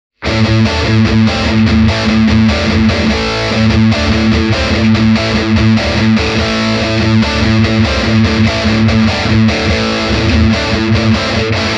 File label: blackstar ht metal 5 od us shure